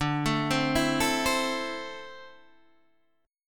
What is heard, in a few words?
D 7th Suspended 2nd down Guitar Open D